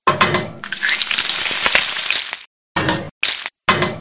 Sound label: tradeskill cooking